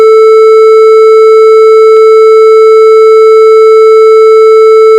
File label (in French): carte son saturée 440hz